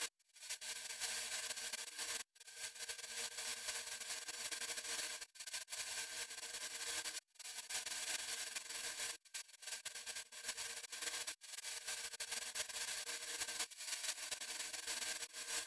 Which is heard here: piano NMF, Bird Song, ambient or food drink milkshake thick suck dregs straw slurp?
piano NMF